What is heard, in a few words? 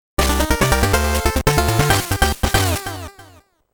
Victory Jingle; Blue Shift; NDS English Training Software